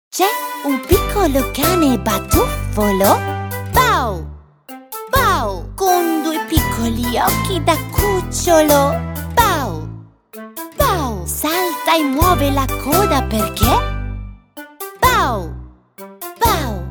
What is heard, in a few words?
Musical Walking Mode Selection Song; ITA